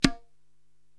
Tabla Tin, Korg TR-Rack

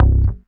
Single Acoustic Basses

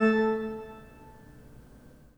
PIPE, 3DOrgans

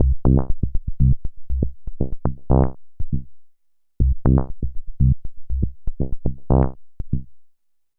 Bassline, Prototechno

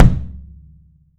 kick axis, Studio Kick Axis; E-MU Producer Series Vol. 5 – 3-D Audio Collection; 3D Percussives

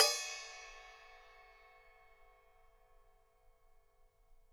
Lil Bell, EMU PX-7 Drum Machines

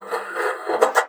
SCHMATZ, BASSOON; Xsample VOL-6

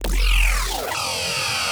Sus, bass

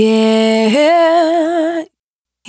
Fragment L, Single Voice Melodic Loops; Singles

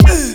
fx kick, ragga dub reggae; USB Soundscan vol.27; AKAI

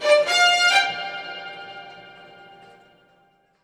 STRG; USB Soundscan vol.32; Roots Disco; AKAI

